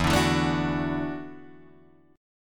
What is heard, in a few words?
E 7th Suspended 2nd Suspended 4th, Guitar, DADGAD; chord shape